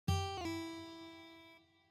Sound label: Slides